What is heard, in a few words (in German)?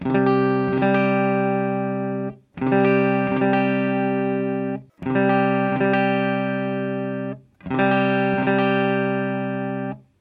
tunervergleich